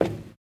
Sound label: Footsteps, Human, Metal, Run, Isolated Steps; Backrooms 2D